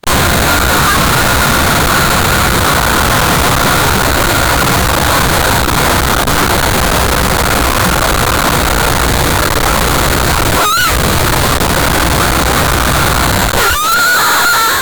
eleven year old girl is, agony

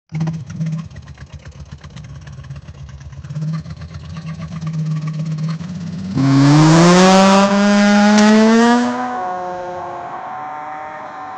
car dart and reving

